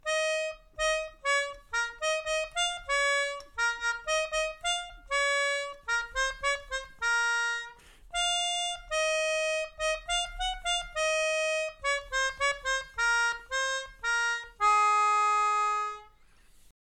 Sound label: Melodica